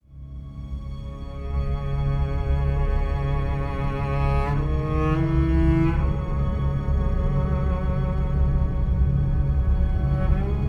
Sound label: Death Stinger